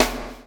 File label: CHAIN; Spectrasonics - Backbeat